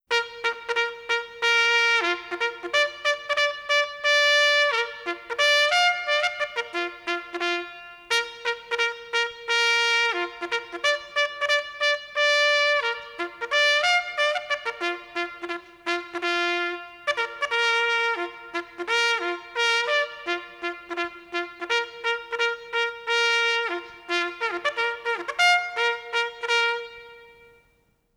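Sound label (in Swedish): Generalsmarsch jägarhorn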